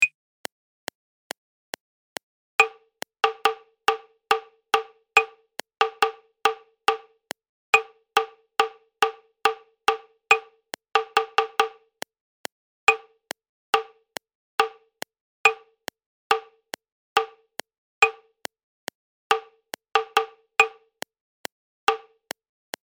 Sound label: Prepared Rhythm; Practice Sheet 2; advanced musicianship